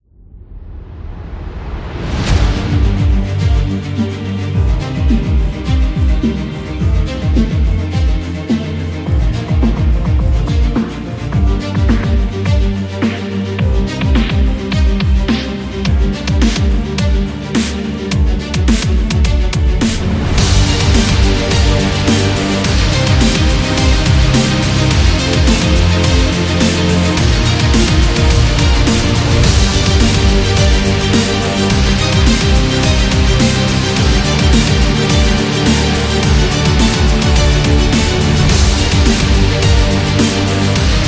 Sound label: Epic Dubstep; epic music vol 3